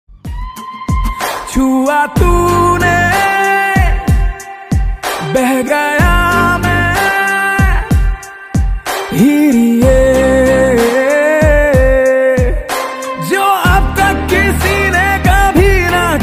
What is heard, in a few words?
ringtone hindi ringtone